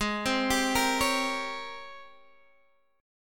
G sharp Major 11th G sharp, down, Guitar, Open D

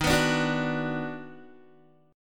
D sharp Minor Major 7th Sharp 5th, Guitar; strummed

